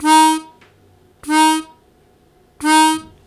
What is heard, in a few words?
Short Attack Eb1, Harmonica; Zero G - Harmonica